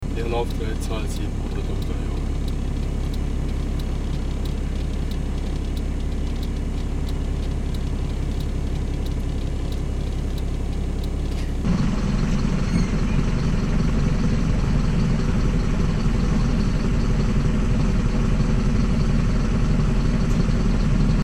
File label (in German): Vergleich Unimog Atego jeweils Leerlauf